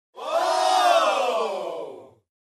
Crowd amazed reaction

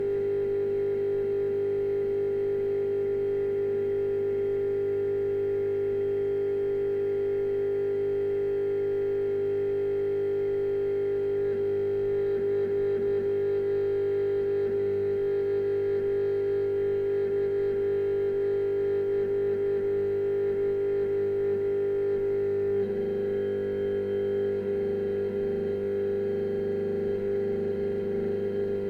Transformer vibration sound

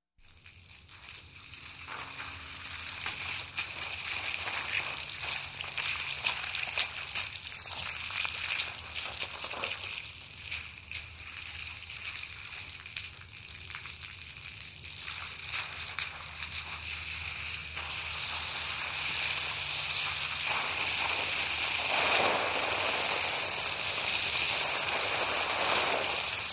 Car washing

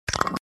Glass Bottle rolling

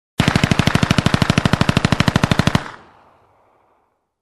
Heavy machine gun shooting

